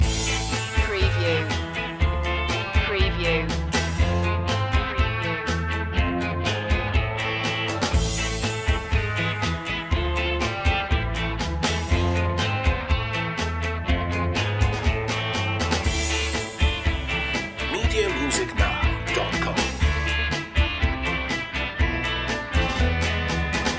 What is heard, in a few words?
cold war ska loop